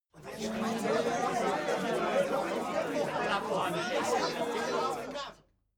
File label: male crowd grumbling short